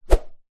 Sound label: cane whoosh through air whip; foley; zapsplat